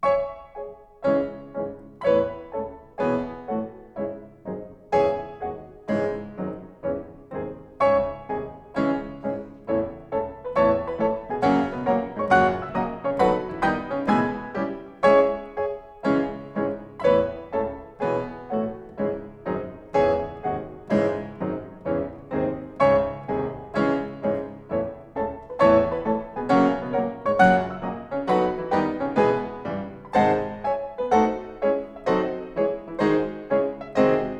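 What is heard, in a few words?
Symphonic Etude, piano; Shura Cherkassky; Schumann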